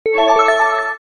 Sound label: open, folder; SV850 Wine 4; LG